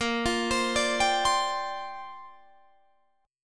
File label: Bb 6th Add 9th Bb Guitar Open D